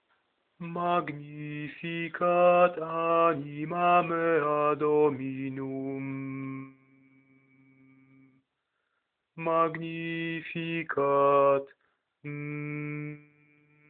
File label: Magnificat alt